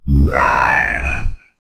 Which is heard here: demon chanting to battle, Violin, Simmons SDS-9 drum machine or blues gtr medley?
demon chanting to battle